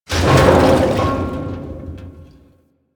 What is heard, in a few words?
Submerged Metal Barrel; Ambience; One Offs; Warframe; Quests; The New War; Drifter Camp